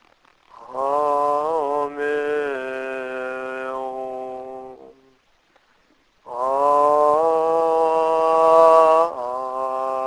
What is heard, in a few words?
healing Mantra Audio; Aspirational